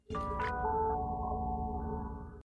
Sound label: alarm